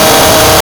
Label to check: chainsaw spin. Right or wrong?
Right